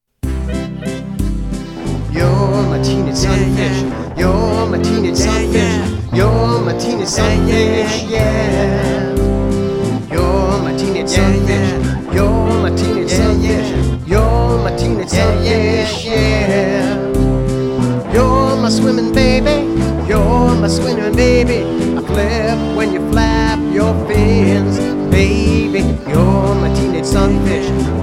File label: sunfish waltz